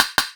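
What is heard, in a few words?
snare Stacky, EMU Orbit-3 drum machine; 300 Drum Machines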